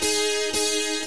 drive

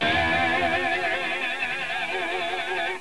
beeps

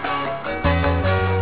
folks, beeps

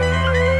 flaming, wizardly sounds, beeps

